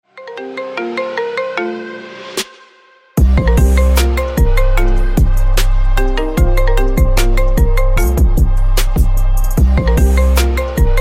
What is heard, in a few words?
marimba iphone siren beat remix